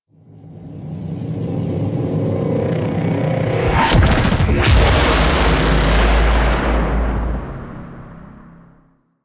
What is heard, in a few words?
Notes Spawn; Warframe; BardQuest; Bard Boss Fight Notes